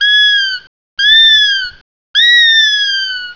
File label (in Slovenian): usni mini zvuk